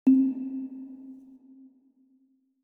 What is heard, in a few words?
ambient